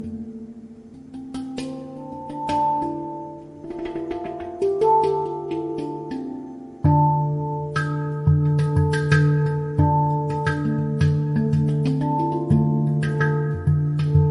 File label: handpan 10 notes; purple space